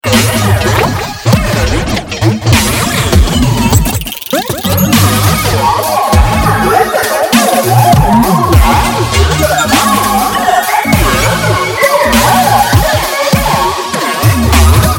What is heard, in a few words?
Boss Stage